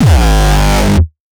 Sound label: kick; shock. Ultimate Kicks vol. 2